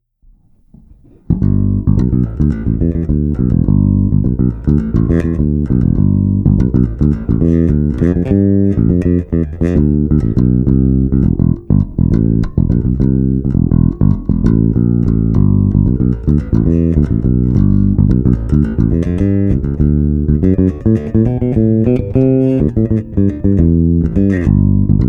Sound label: finger direct